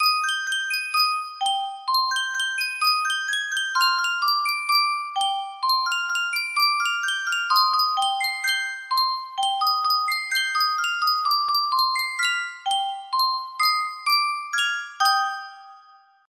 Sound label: Matthey music box